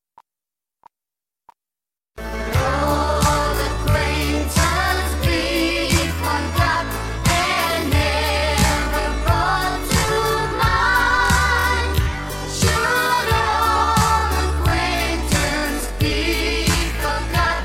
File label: Christmas, C, backing track